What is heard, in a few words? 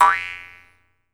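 Jaw Harps, Pitched Percussion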